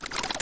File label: Deku Fly; Zelda; MM